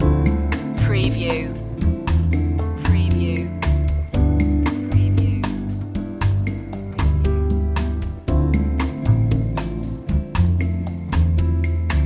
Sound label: moody bossa loop